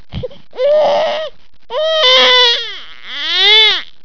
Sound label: crying